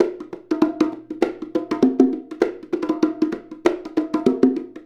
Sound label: Bongo; Essential Percussion